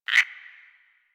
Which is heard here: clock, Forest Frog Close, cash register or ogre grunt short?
Forest Frog Close